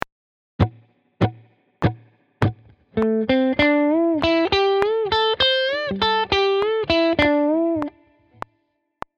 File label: blues, guitar